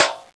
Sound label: hit; madcars2; radicalplay